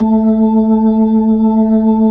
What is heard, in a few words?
B-3, Jazz, Keys of the 60s and 70s; Roland LCDP10 Keys of the 60s and 70s 2